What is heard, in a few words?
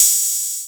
open high hat b key, open high-hat sample tuned to the B note